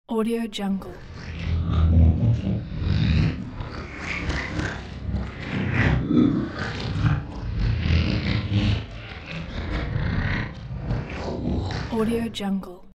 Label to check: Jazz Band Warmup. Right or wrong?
Wrong